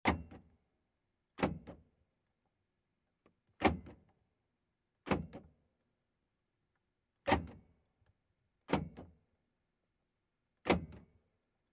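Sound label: Car Door Locks Powered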